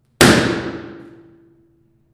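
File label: reverb Hall Atrium Outside of Laundry Room; Pomona; Wig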